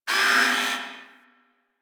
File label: Gas Robot Repair Arm; Warframe; Corpus Gas City